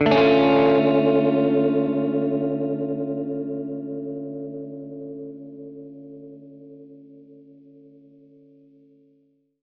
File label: Gretsch Chord Dmin[maj7], Guitar Chords